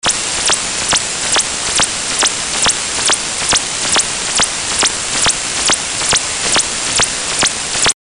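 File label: Mystery 12530 kHz; ham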